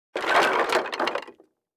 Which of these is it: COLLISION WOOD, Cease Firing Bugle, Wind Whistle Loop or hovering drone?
COLLISION WOOD